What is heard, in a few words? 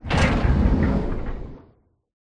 giant arm action, machine